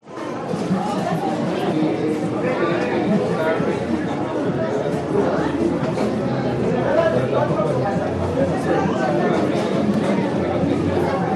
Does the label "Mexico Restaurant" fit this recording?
Yes